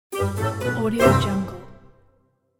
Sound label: cartoon ending